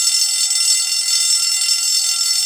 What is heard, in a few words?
FIRE BELL; BELLS